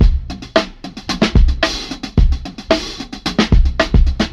dirty drums, Drum loops Funky

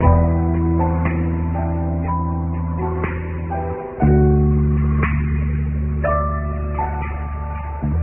piano, Sad

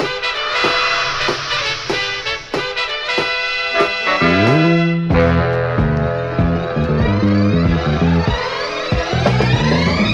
horns, Phonky loops

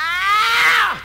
ow

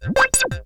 leep; Zaps and Beeps

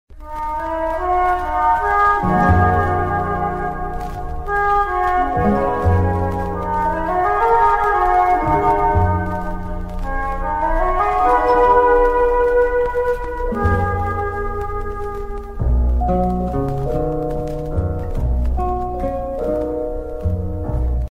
creamy flute

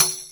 tamborine

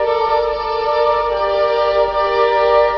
strings, Loops, Sad

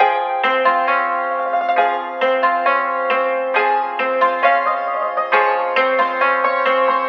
piano nippon, Loops; Phonky

